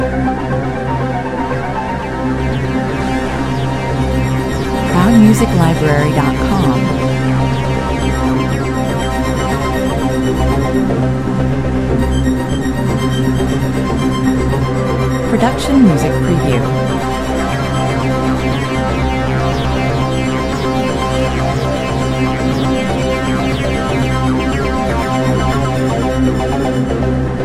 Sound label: without drums techno loop